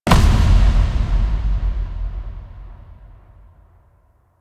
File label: Drop Down Landing; Warframe; Apostasy